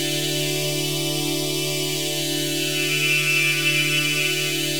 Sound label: Pad Loop D, shimmer and sparkle; Pad Loops